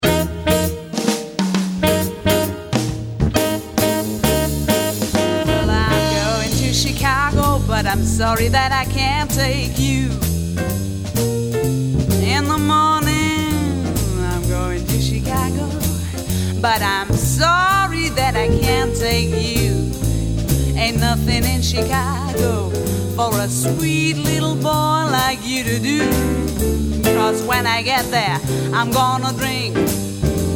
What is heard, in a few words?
blues